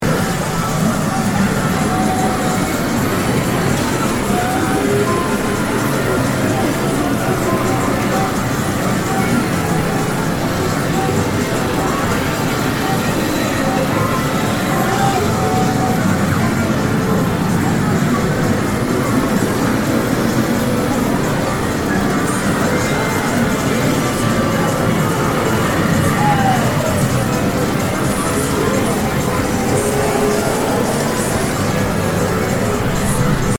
pachinco

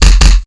kick; attack

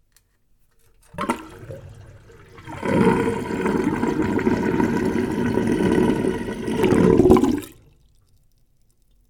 drain washbasin, 洗面台の水を抜く; wetarea

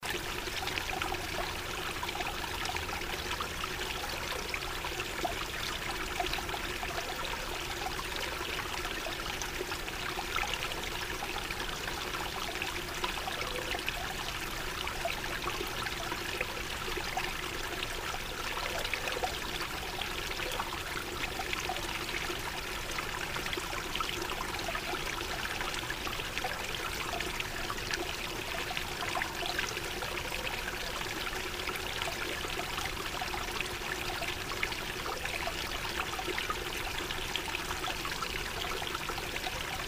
springwater; nature

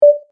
button

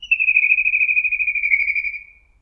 little grebe; animals

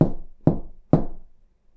knock on house wall; knocking

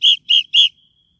shrill whistle

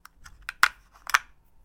batts from clicker; electric

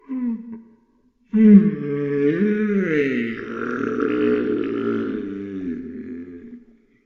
monster mourn

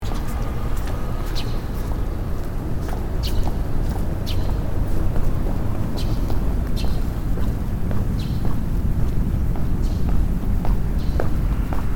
morning streets

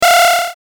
powerup

